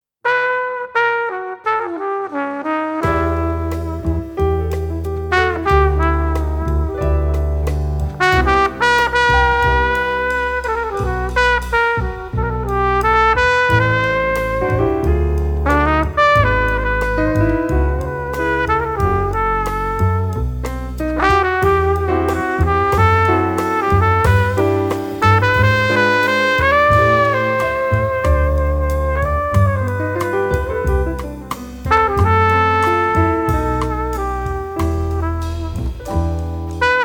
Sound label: no bossa